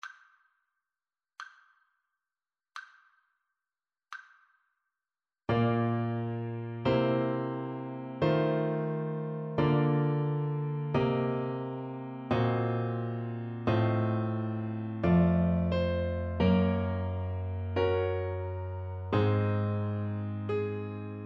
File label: harmonic dictation; eartraining